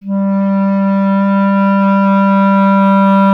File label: clarinet G, Solo Instruments; Miroslav Vitous - Solo Instruments VOL-1; 90 s Sample CDs